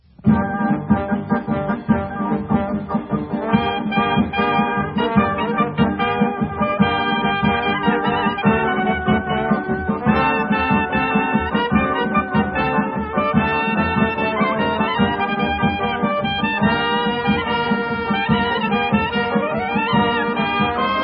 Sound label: Instrumental; Nigun; Fred Berk